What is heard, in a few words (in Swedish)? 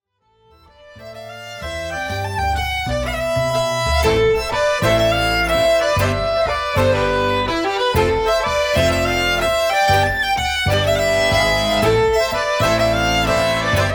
schottis; hohka; strommingarnas; etnonet